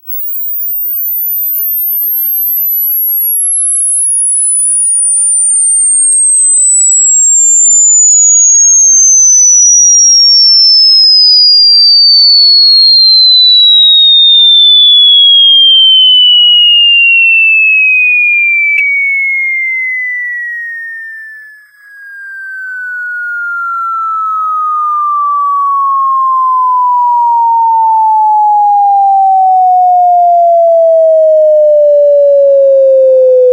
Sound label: Bass Test 20 kHz